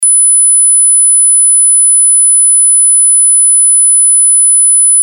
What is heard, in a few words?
10kHz pure tone; INSTRUMENTOS MUSICAIS